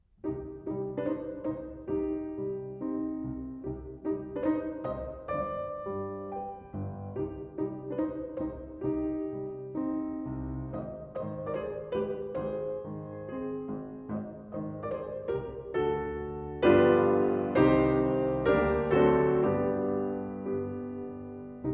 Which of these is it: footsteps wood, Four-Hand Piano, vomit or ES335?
Four-Hand Piano